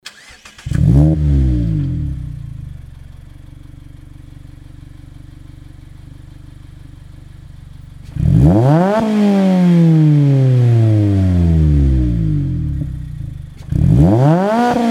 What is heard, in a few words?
nd roadster fujimura; ジェントルサウンドマフラー; Mazda ND ロードスター マフラーの消音作業; マフラーの出口に向けて収録すると やはりインナーサイレンサー特有の排気音が聞こえますが; nd5rc; テールエンドに 77ミリのインナーサイレンサー